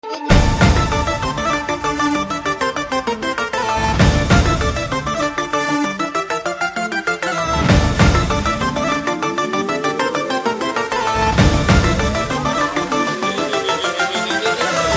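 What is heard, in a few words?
background Ringtone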